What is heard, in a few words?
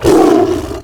hurt; CosmicRage; combat; creatures; tiger